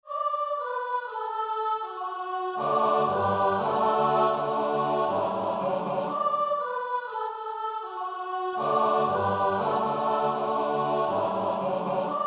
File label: choir; mayo nafwa